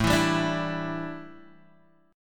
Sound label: A 7th Suspended 4th, A7sus4 chord; A7sus4 strummed